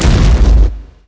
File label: Chain blast; ImpGal2; spacewar